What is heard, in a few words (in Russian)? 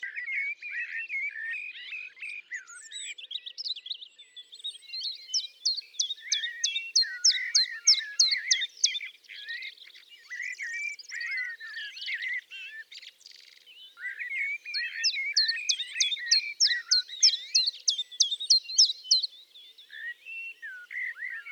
penochka tenkovka